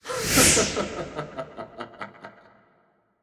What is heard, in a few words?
Laughter; Yu-Gi-Oh! Master Duel